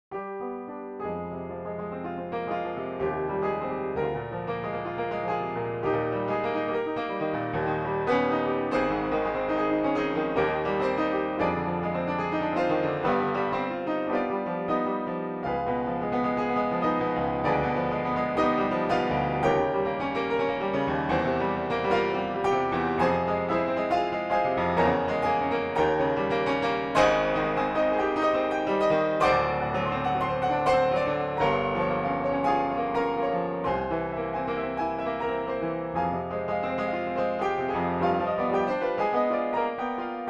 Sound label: Pleyel Upright